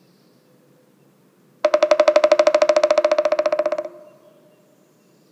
pilleated woodpecker drum

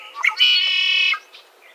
red winged blackbird

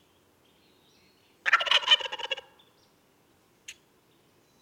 wild turkey male

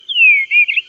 eastern bluebird

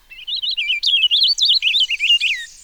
purple finch

